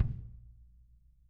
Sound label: KICK, Drum Kits